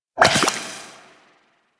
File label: Goo Gun Tail